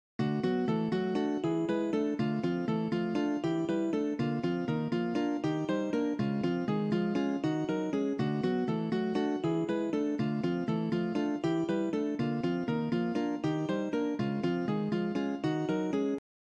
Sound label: cont rhythm example